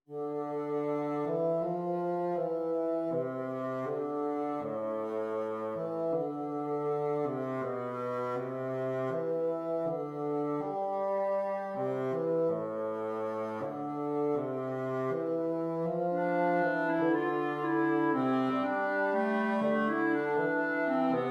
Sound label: Study for Woodwind Quartet; early works; two studies